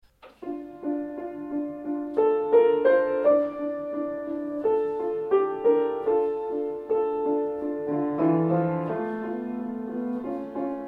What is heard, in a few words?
Miniature